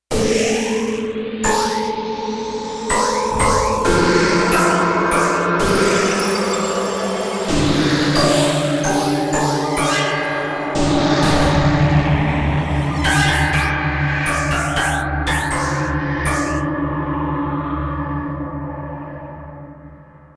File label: FX Menu